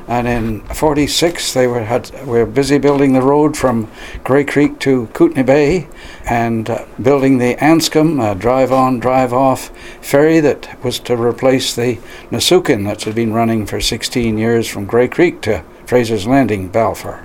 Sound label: Tom